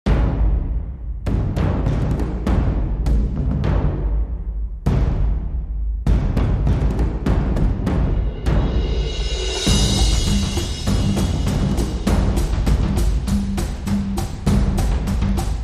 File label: Pirates Drums Percussion